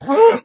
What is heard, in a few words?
cow hurt